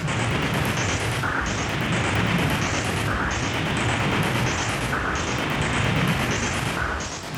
Moving Noise 130, Tempo Loops 130bpm

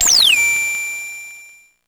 Drum, Electro-Harmonix Spacedrum

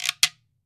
GUIRO, Cuba; AKAI S6000 CD-ROM - Volume 5

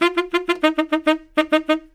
SAX LICK, BRASS LICKS; Ueberschall; Jam Box

